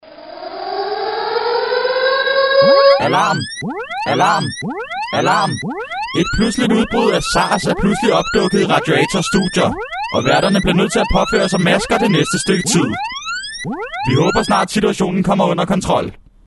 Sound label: Sarsalarm